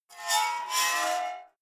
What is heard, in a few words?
metal scrape rich fast